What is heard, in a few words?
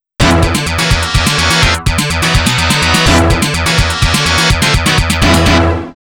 Stage Clear; SNK Arcade Sound Digital Collection Vol. 01